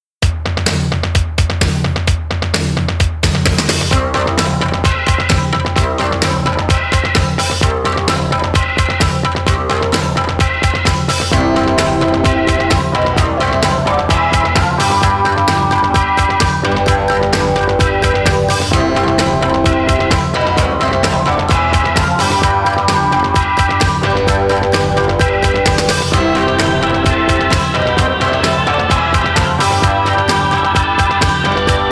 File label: Instrumental